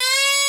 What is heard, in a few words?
FLUTE